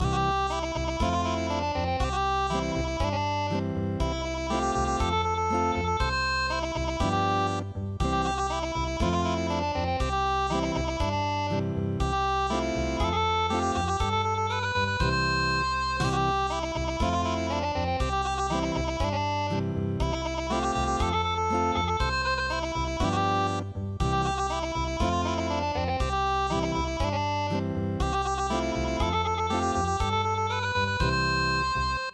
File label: Unplugged; donguri korokoro